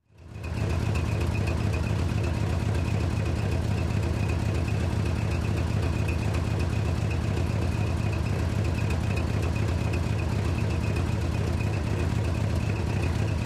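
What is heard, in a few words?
sound of a torn-off muffler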